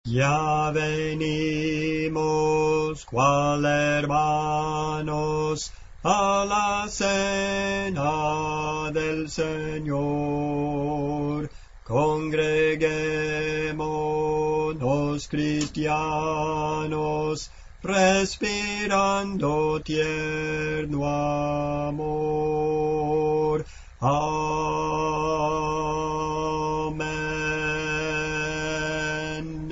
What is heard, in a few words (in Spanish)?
Contralto, Cantos Espirituales; 029 Ya Venimos, Cual Hermanos; Clase del Canto